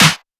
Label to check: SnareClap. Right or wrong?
Right